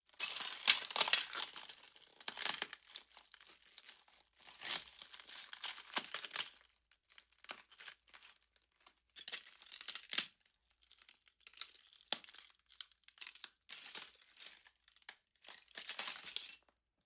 Glass, Crush, Debris, Compress, Constant, Heavy, Violent, Uneasy; Neumann; Airborne Sound; Elements Glass